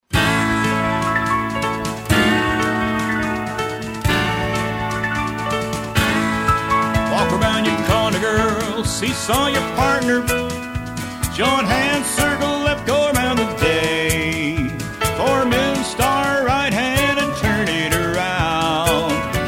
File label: vocal